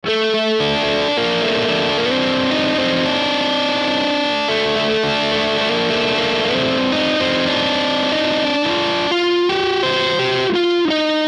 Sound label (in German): Ibanez RGD71AL Zerre Neck